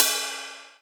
Ride, Rides; Electronisounds

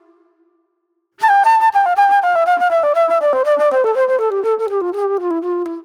mellow soul flute

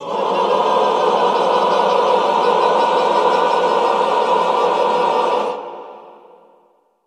classical choir, cluster; Best Service; Extended Classical Choir

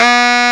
SAX A, Baritone Sax

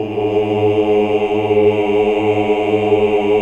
OOH. OOH UNI; Hallelujah

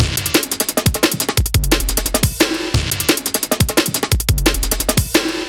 175, classic dnb breaks

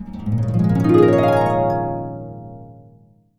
C# PENTATO, HARP; AKAI S-Series CD-ROM Sound Library VOL-2; HARP GL

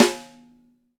XV Snare, Roland XV-5080

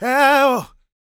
GOSPEL MALE; Spectrasonics Vocal Planet

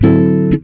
GTR CHORD; Univers Sons; Jazzistic

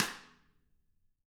Drums, SNRR; CAMCO

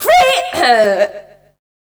Dance Vocals, FEMALE

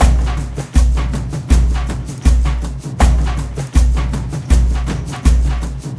AF TRANCE; Spectrasonics; Heart of Africa 2

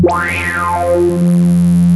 REZZ DEEP

